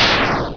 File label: crash